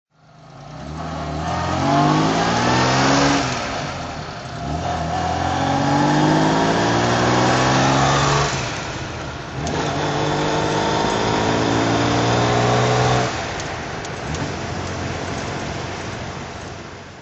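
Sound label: jaguar mk4 drive, engine sound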